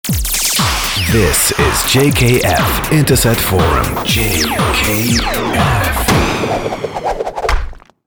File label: jingle